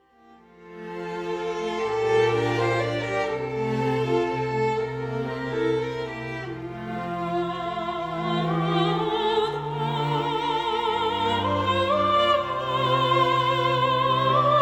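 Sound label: String Quartet With Soprano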